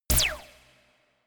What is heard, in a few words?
space laser gun short silencer; soundpool